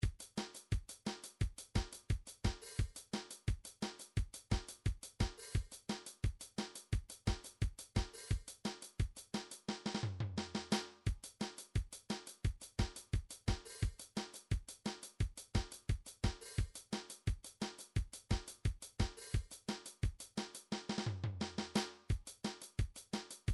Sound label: drums ska